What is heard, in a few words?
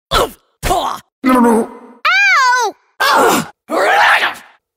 Being Hit; Monster Train